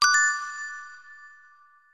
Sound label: Bonus